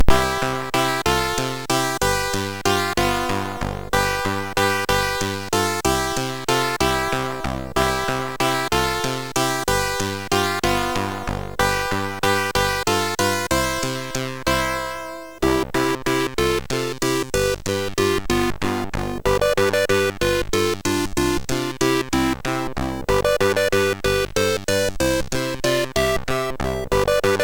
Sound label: Alpen-Style, sid MOS6581R3